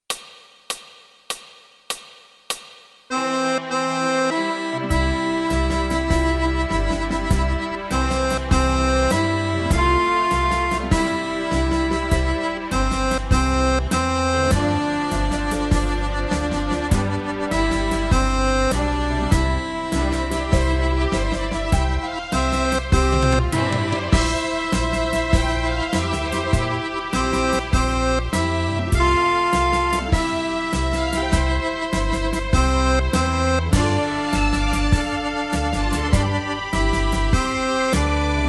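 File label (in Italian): Fisarmonica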